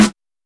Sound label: Snare March